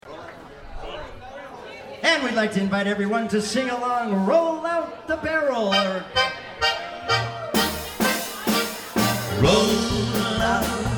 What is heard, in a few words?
German Band; Orlando